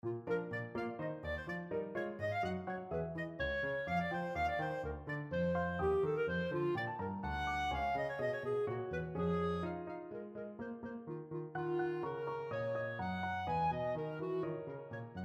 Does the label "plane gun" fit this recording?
No